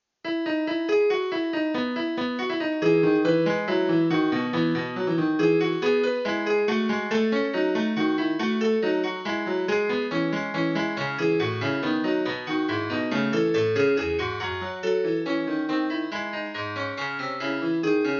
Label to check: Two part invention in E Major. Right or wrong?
Right